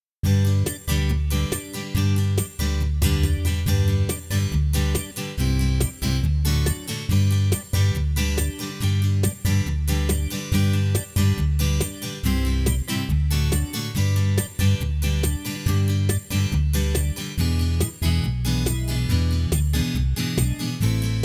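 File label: backing track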